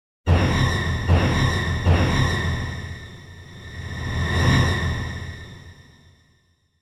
Symphonic Hit Strings And Drum Murder Finale - More Than triple; Hollywood Edge; sound designer's toolkit v2